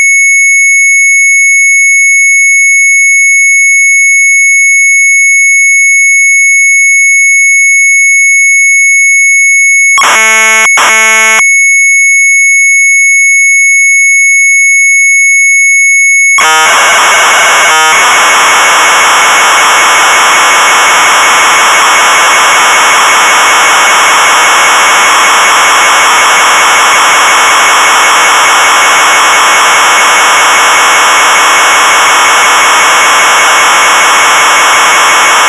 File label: MZ800 Tape; Sharpy's Eng.4A; retromelsarchive